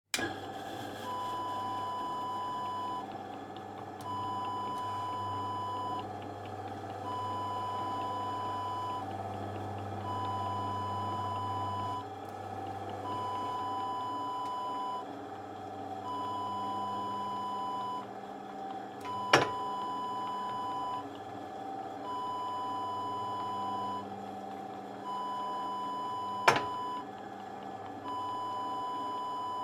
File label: electric mangle